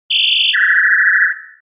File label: loud sci fi